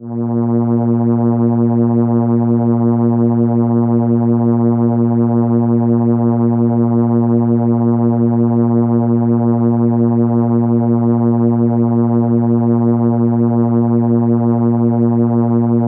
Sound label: Cello A#3, ARP Solina